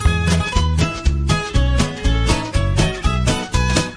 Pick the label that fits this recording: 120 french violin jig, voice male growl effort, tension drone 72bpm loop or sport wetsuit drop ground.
120 french violin jig